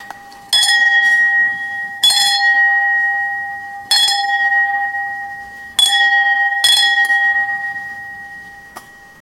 wrestling ring bell

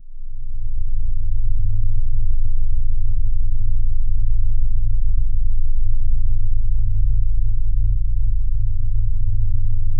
low ambient drone; atmospheric

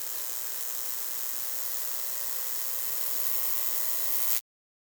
rising electric whirr, cartoony; 1s

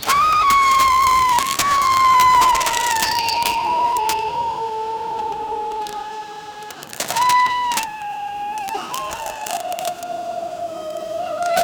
teddy bear battlefield wi

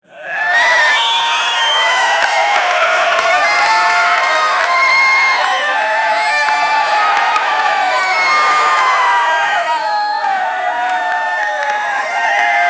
rooster crowing; applause

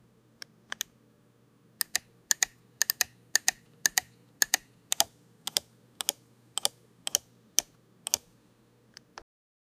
create a sound where, button

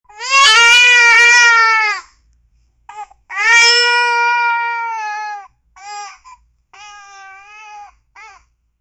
baby deer crying

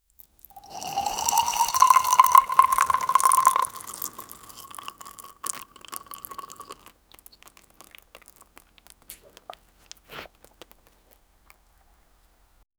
honey dripping thick; liquids

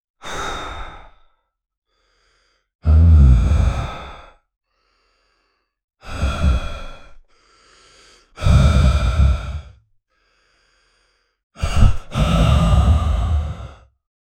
heavy breathing; atmosphere

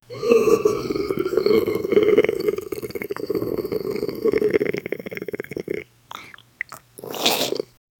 zombie slurping savoring

